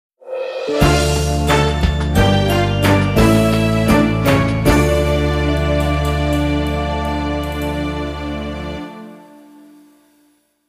Music Menu event

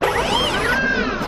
Servo; blastmorph pinball; xbox series xs

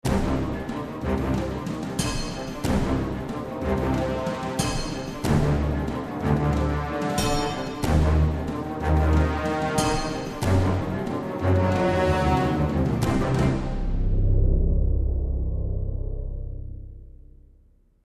Action

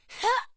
grunt; Angela

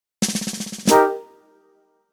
Playword; Buzz

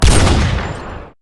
Rifle; MilkChoco

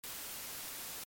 Noise

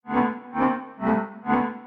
Stinger Menu Options

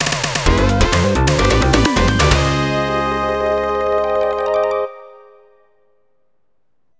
game clear; Tetris Collection; PS2

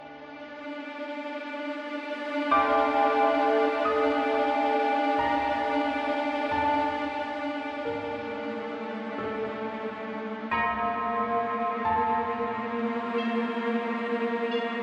Mystical House Looped; Dual Blade: Battle of the Female Ninja

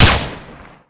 Rifle; MilkChoco

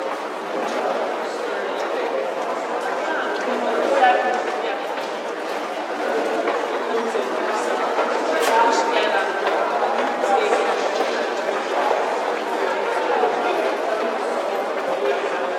Fem Pasv Many Corridor; Prison Architect

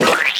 Splat Large

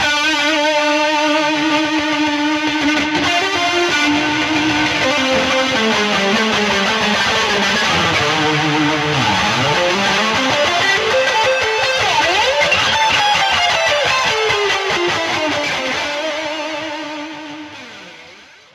Metal Solo; Torchlight III; PS4

